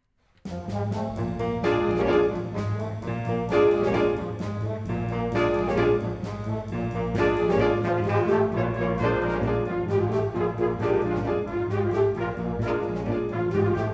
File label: jazz band